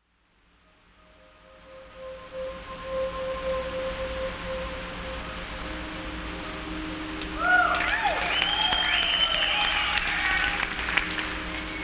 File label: Piano Medley